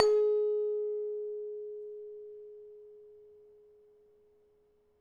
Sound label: small plate; bellplates